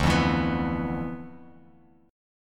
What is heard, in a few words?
D Minor 6th Add 9th D, Bass Standard; Dm6add9 strummed; JGuitar